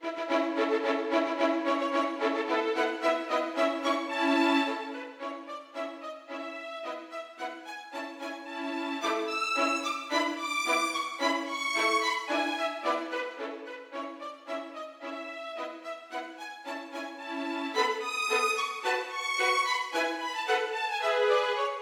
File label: Gallopade; Ursin